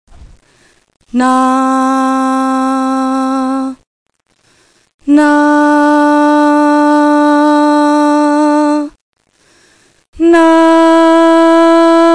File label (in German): menschl Stimme, Ton a